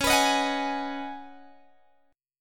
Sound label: C sharp 7th Suspended 2nd Suspended 4th C sharp down Guitar Open G